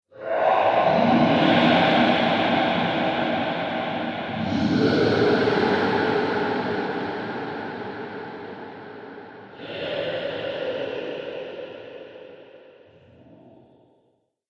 Chamber Of Devils, Scary Soundscape Sound Effects

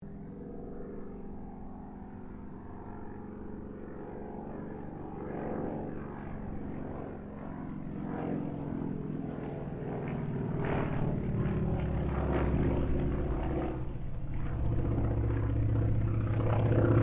AIRCRAFT CAC WIRRAWAY PASS DISTANT STEREO

